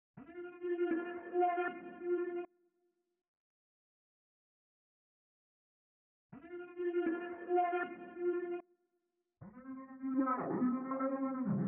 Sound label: 156 BPM F Min Lead; Cymatics; Intergalactic; 2022 Melody Collection Platinum Expansion